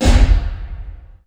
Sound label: Hit, Drum Samples Alesis SR-16